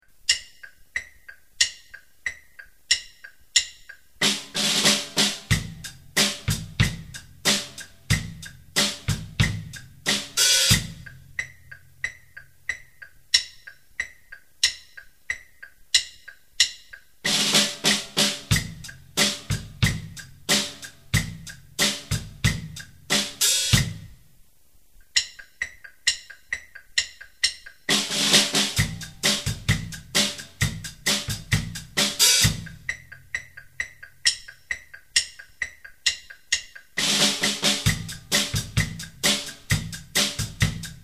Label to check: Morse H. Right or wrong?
Wrong